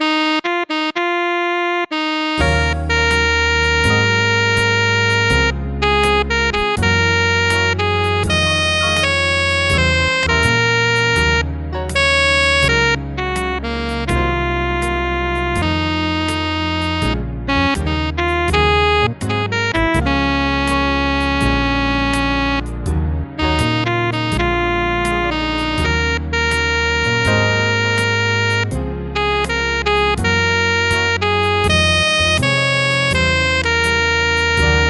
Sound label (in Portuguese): piano eletronico MIDI; INSTRUMENTOS MUSICAIS